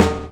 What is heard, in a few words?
Snares Electro